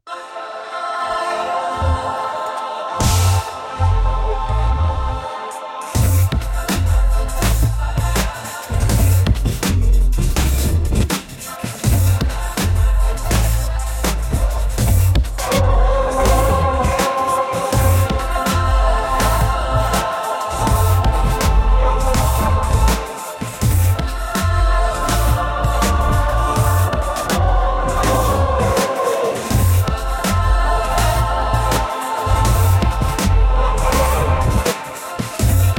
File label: choral mixed with dnb amen bit